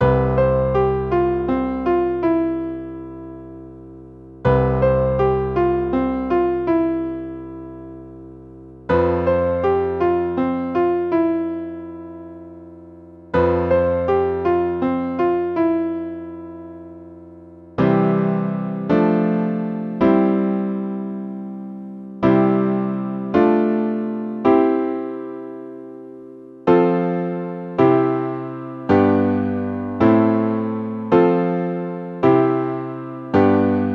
Piano sans finale

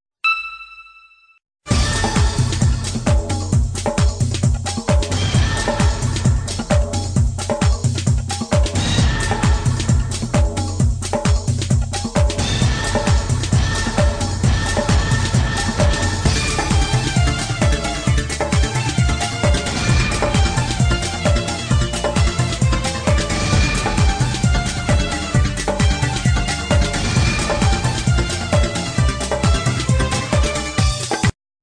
orientale techno

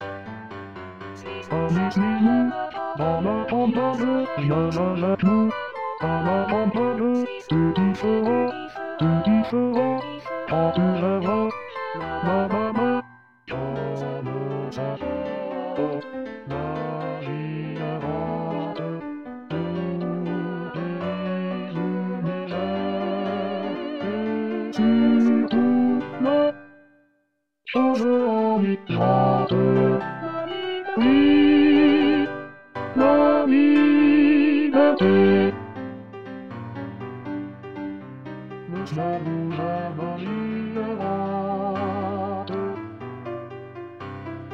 Basse; CARMEN La liberte

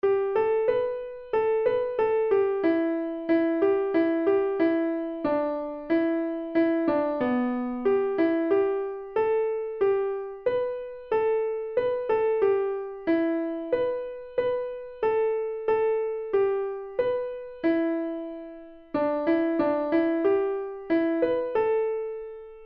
air seul lent

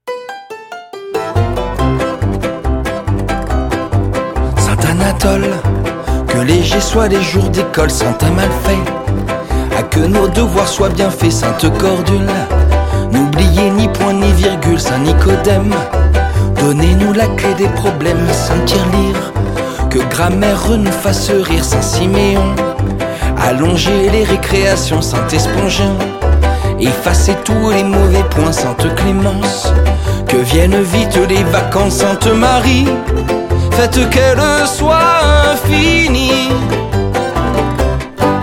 chanson; litanie des ecoliers

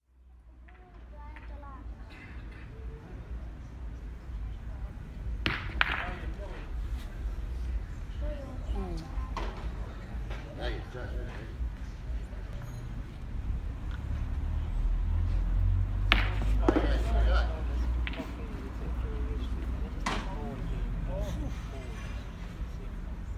jeu petanque bocci; Malte

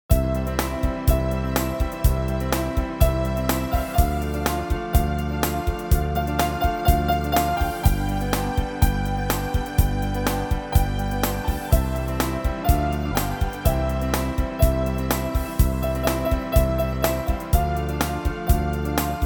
BALLADE 3 TEMPS

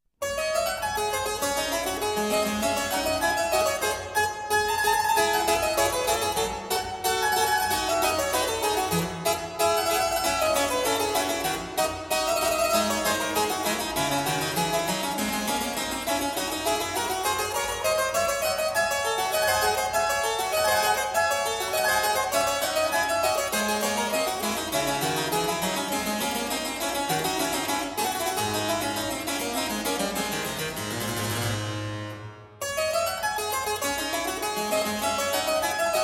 Clavecin

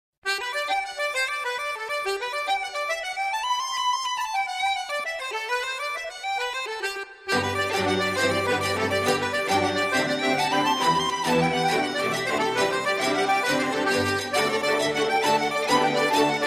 celtique

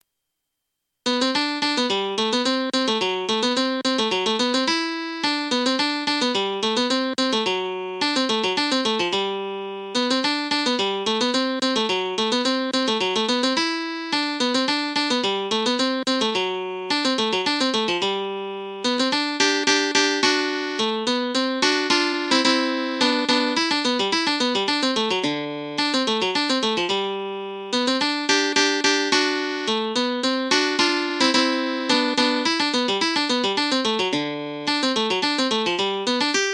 cotula polka mexicaine

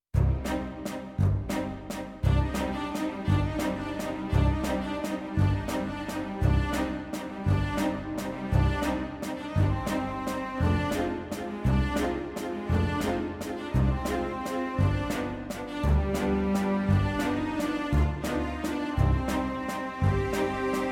Valse

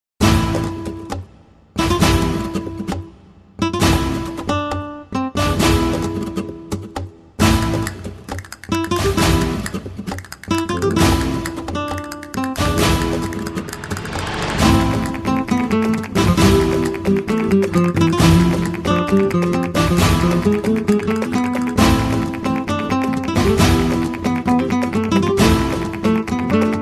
tango espagne

